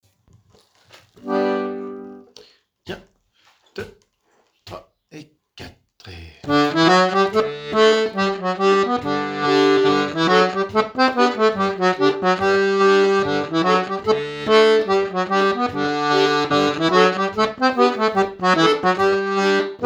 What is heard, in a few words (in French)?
Scottiche des Causses